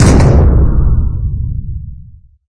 Switch; MapleShrine; mkxp; gameasync

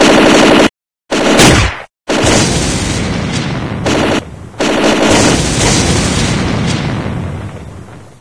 battle; MapleShrine

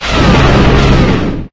Burst; MapleShrine; mkxp; gameasync

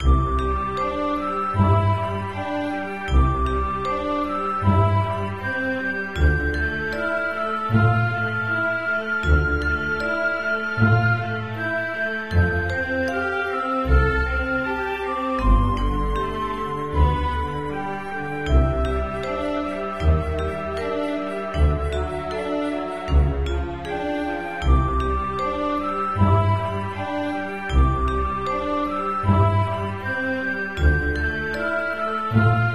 Town; MapleShrine; mkxp; gameasync

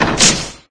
Door; MapleShrine; mkxp; gameasync

